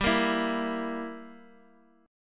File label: G Minor 6th, Guitar Half Step Down